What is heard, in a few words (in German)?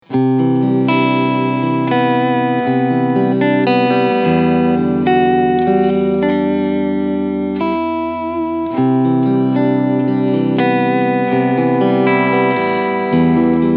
Clean Chords beide PUs